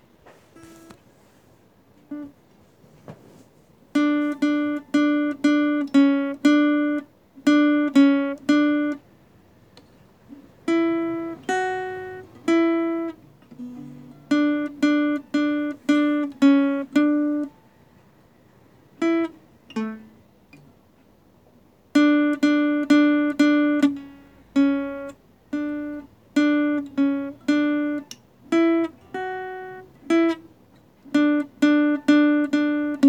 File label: Guitar